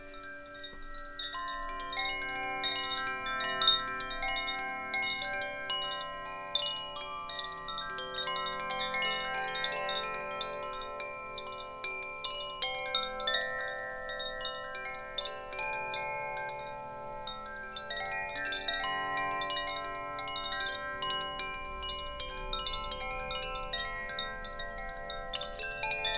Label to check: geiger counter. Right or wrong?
Wrong